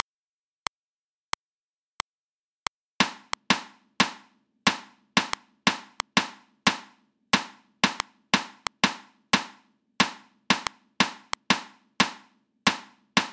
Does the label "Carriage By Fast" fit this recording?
No